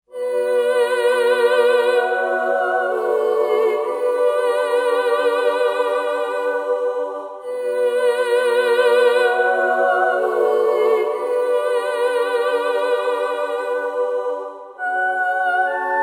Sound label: New Tokyo Vox